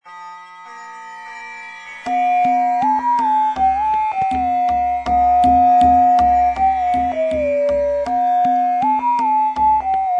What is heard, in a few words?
AI Raga Bhairavi Flute